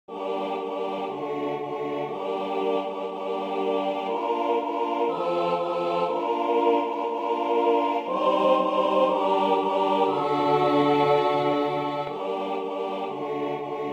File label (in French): Sanctus Tutti; Messe De San Lorenzo